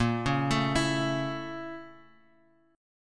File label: A sharp Minor Major 7th Flat 5th, Guitar Open G